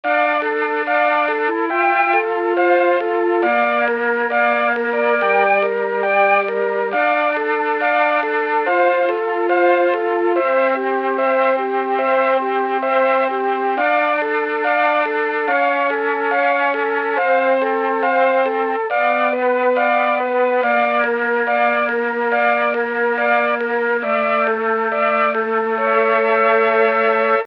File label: Mellotron Flute